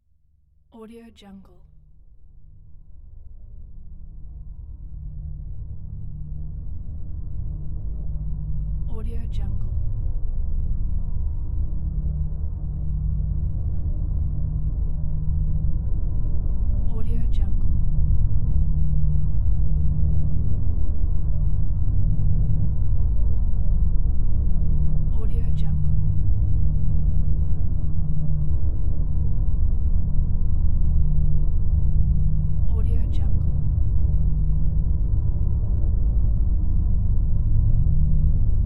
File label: drone ambience